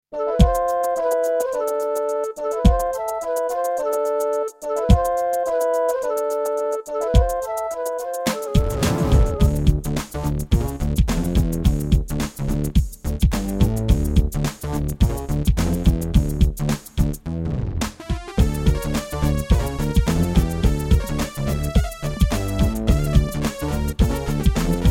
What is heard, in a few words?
Egypt, Korg 05RW; Lost Vikings